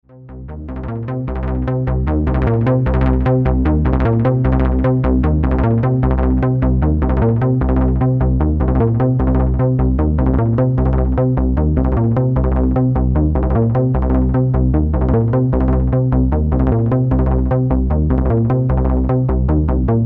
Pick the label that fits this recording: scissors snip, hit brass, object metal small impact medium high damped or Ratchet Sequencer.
Ratchet Sequencer